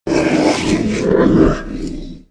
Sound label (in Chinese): 挑衅, 鬼,幽灵; Enemy